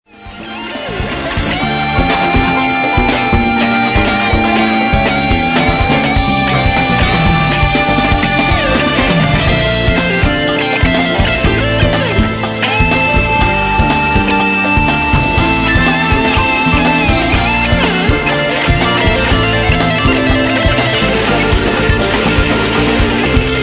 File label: Samba